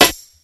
crisp acoustic snare sample e key

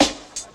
steel snare drum f sharp key

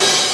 dark open hat sample f sharp key

